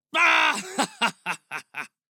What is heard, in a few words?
Human Laughter Hearty; noise creations